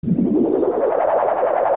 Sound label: Twinrova Ice Charge; OOT